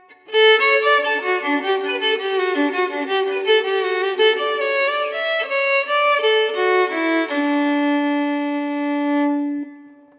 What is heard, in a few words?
Solo Fiddle Playing Part Of A Hornpipe